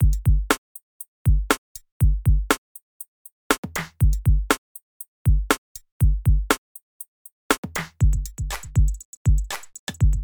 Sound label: Band Drums Kit 120